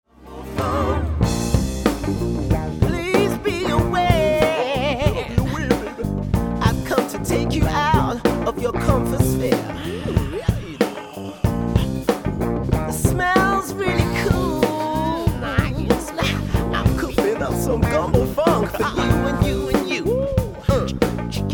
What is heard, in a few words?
Funk